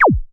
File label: laser; lasers